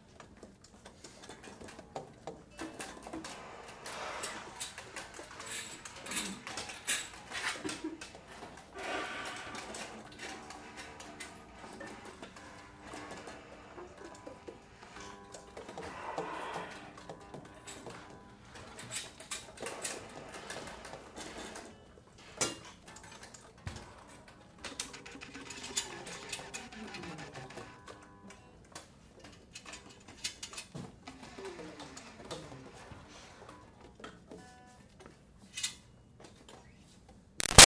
Activity Music Class Instrument Presence Busy, school; Backgrounds; Schools